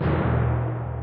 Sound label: Tymp